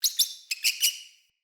Messenger Rat Squeak E; Ambient; Vocals; Verminia; Animals

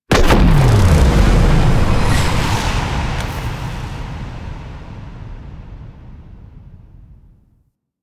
Capital Ship Destroy Final Explosion; Warframe; Vehicles; RailJack Enemy Ships; Corpus

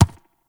Ram Step Walk Dirt; Warframe; Duviri; Animals

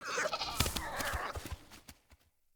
Sit Spawn Fawn Run; Dendria

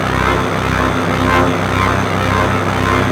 Head Loop; Hub; Simaris

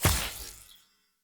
Idol Projectile Default, Weapon Impacts; Idols

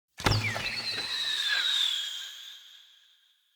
Bow Shot Arrow Rain; Weapons; Player; DendritBow

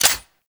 Sniper Skin Reload Clip Out; Warframe; Weapons; Venkra Sniper Skin

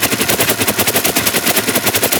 Boomerang Loop; Grineer; Weapons

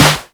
Bard EDM Pack A Slot 2 A; Warframe; Warframes; Bard Instrument Packs

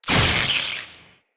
Galvanic Zapper Arc

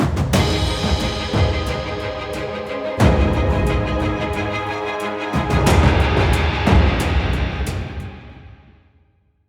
Skirmish Complete Cue; Empyrean; Warframe

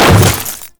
Step Ice, Footsteps; Enemies; Warframe; Grineer Hammer Boss

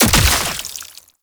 Dismember Gore Impact; Warframe; Bullet Impacts

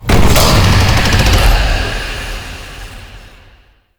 Vaults UI Vault Open; Gameplay; Warframe; Entrati

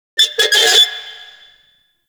Forest Metal Squeak Rand; Warframe; Grineer

